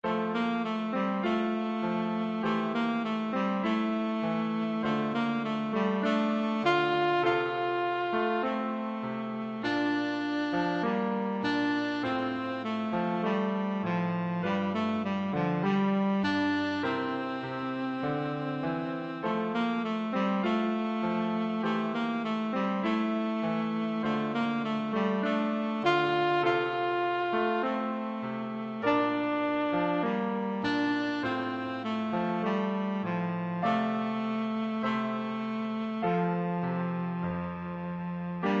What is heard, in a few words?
fence alto